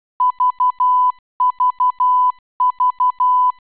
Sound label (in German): rausch filtered